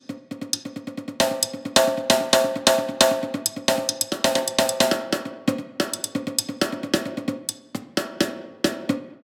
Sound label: Sensory Percussion Hybrid Snare; Sunhouse